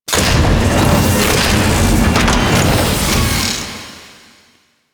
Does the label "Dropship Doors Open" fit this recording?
Yes